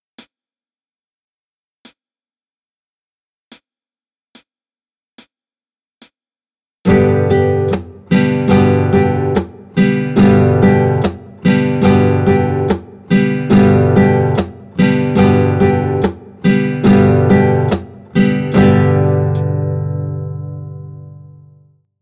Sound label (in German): uebungsbeispiel in langsamem tempo